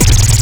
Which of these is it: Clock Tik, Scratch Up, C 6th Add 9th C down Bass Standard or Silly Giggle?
Scratch Up